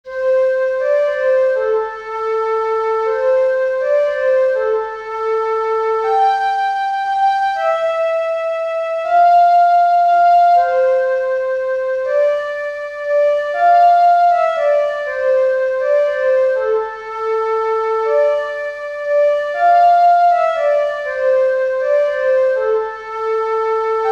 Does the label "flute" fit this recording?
Yes